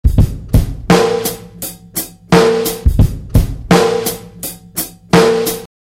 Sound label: drumloop